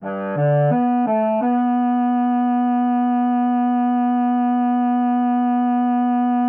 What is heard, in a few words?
bowed b body d